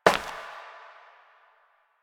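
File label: Step Glass, footsteps; Warframe; Koumei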